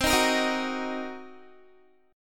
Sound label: C Minor 7th Flat 5th, C, Guitar; strummed; Cm7b5; 4E,4A,5D,5G,5C,6F; 20,18,20,20,18,x